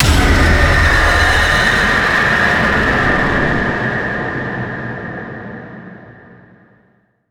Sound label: archeology event screams; ancient relics